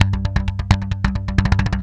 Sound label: Brush Fill, Industrial; Nasty